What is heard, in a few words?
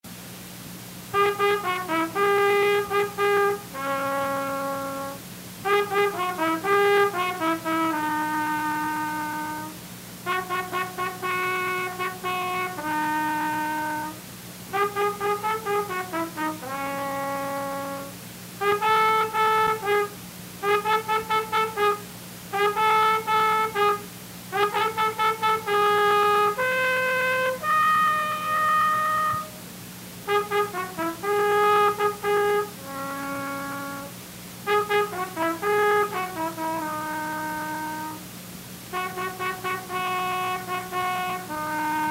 Instrumental